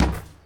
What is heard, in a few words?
Street Ball Glass Basket; SFX Kit; Street Basketball